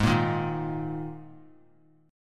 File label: Ab Suspended 2nd; strummed; JGuitar